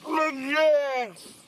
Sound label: seal